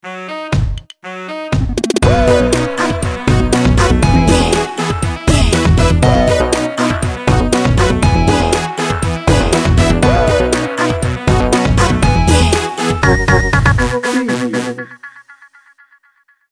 synth pop party

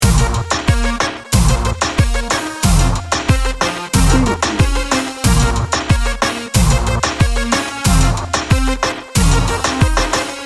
reggaeton seduction loop

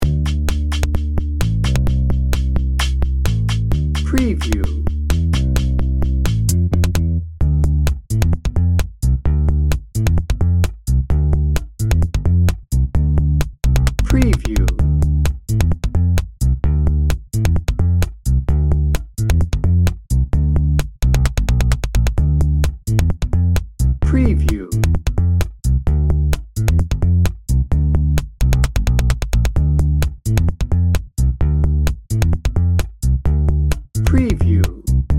whole lotta perky dnb; Cheerful, lively Latin track. Featuring punchy horns, sensuous piano with sax and trumpet

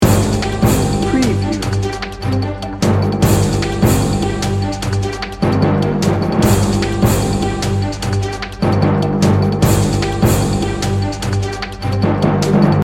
anchor news loop

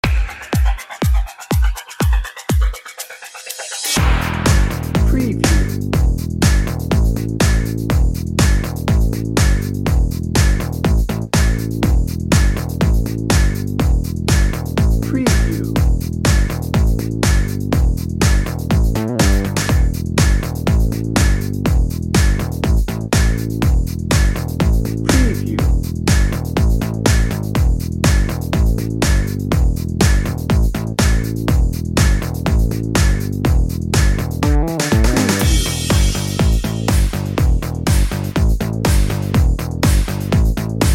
radiant energy dnb

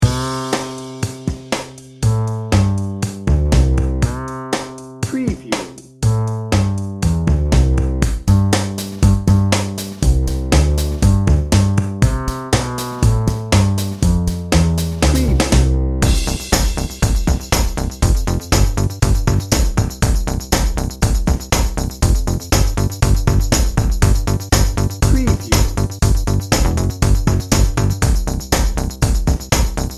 deck the halls

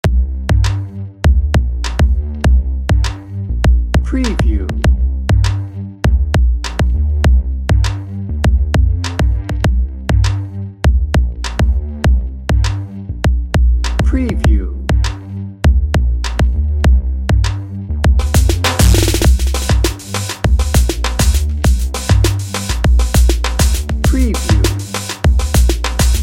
electro motion dnb

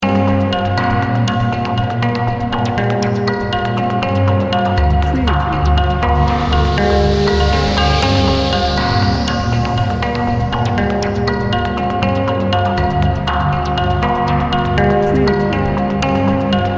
zen beach

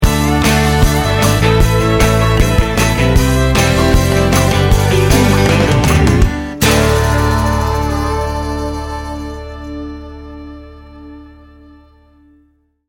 country good times sting bumper

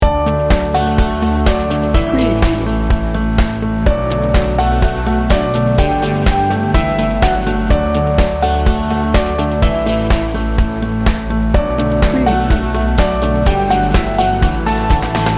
upbeat inspiring corporate pop loop

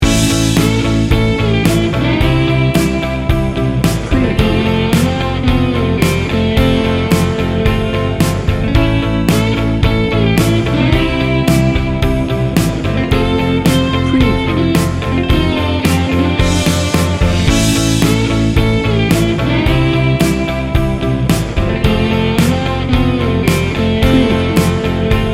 heartwarming

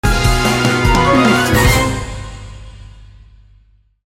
anchor news sting bumper

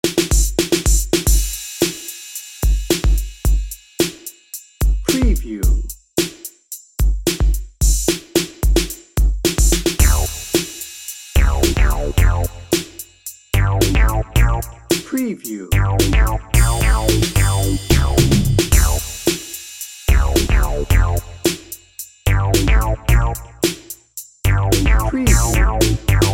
bright and pulsing dnb

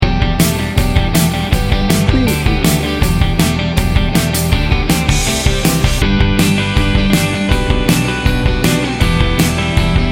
indie pop rock

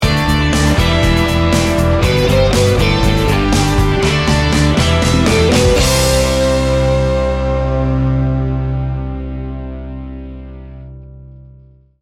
americana rock sting bumper

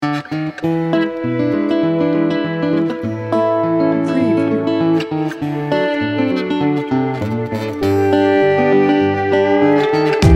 folk slide

